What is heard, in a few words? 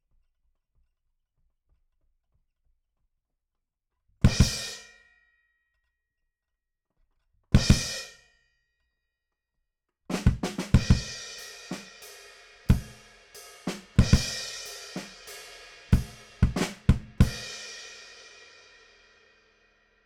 BD Out